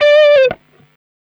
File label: Lifestyle Guitar Solo C 125 Dm Dry